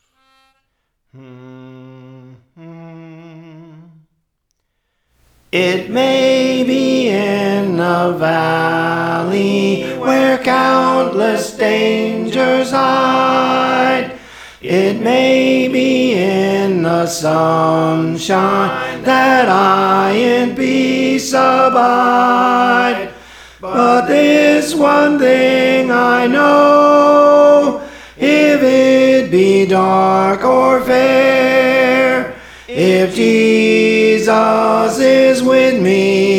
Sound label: Tenor; Praise For The Lord Training